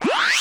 CHARA RESULT CENTER; maimai

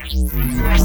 bass purge

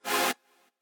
chord

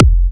Kick

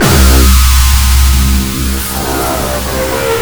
bass purge

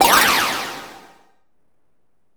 SE RESULT CLEAR; maimai

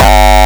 Kick hardstyle; 梁非凡

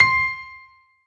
piano echo

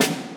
orch snare

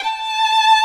violin

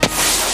Snare raw; 音MAD; 请问您今天要来点兔子吗？？

